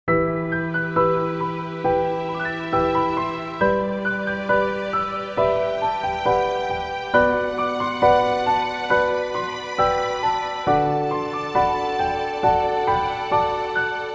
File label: Piano Ballade